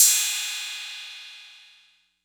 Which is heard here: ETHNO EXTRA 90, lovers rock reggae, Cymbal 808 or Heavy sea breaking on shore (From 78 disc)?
Cymbal 808